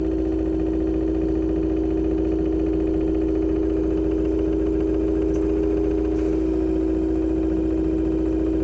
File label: generator; kzra wired